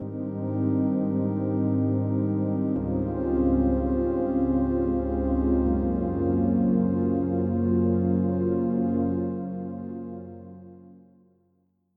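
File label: soundtrack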